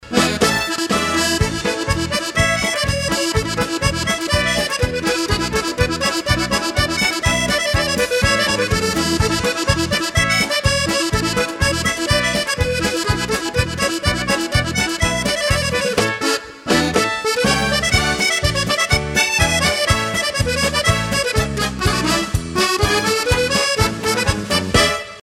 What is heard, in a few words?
accordeon